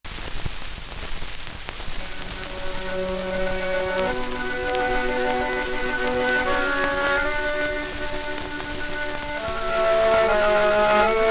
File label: polka; Ukrainskaya